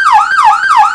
yelp, sirens; whelen 295hsfa6

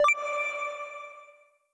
Granted; blue archive

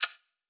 Clock; blue archive

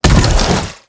Drone Missile Attack Start; blue archive

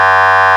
horn, sirens; federal sig 80k

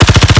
Drone Vulcan Attacking; blue archive

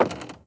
Foot Step; blue archive

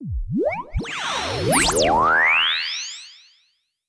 Gacha Normal; blue archive

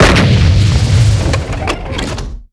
Heavy Tank Cannon; blue archive

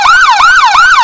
yelp, sirens; whelen cencom sapphire

